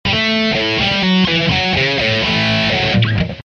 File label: A5 124 chords